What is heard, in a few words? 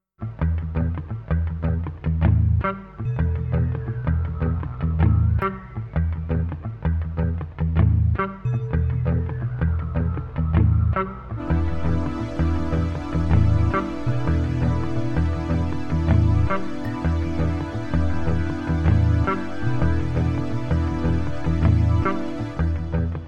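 Guitar Strings with rain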